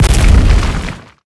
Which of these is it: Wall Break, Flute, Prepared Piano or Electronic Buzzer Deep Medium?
Wall Break